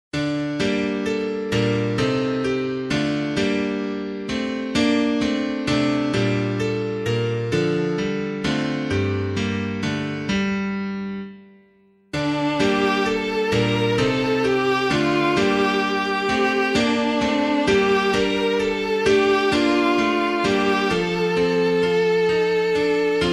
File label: piano, hymn; Two Noble Saints Both Rooted; LeCroy; DE EERSTEN ZUN DE LAATSTEN; Decora lux aeternitatis auream; Elpis